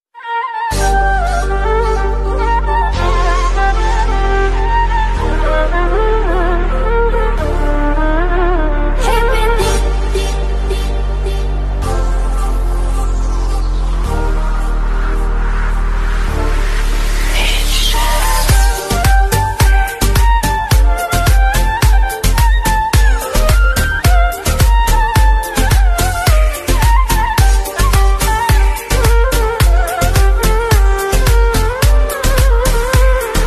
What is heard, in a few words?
Mobile Ringtone